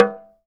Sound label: TALK DRM, Heart of Africa; Spectrasonics